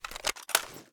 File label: ar reload empty rotate; weapons; gmod; viper; scharlie